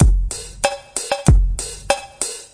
kindof dumb kick agogo, drum loops and breaks, 95 bpm; 091 to 100 bpm